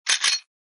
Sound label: box unlock